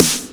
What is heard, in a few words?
snare, DnB Breakz Kit; Breaks N Beats Sound Kit